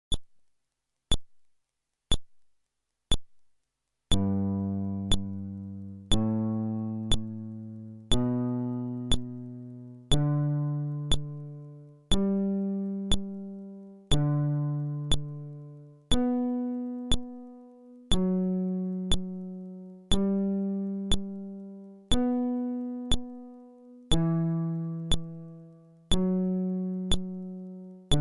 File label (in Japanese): フレットレス